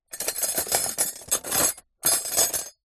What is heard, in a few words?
household cutlery metal movement in plastic tub